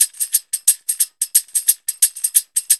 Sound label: LOOP; E-Lab; X Static Goldmine 2; akai